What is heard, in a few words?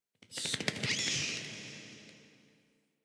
Interior Cave Bat; Warframe; Ambience; Duviri